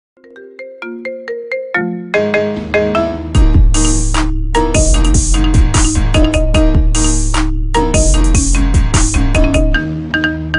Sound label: Remix; Iphone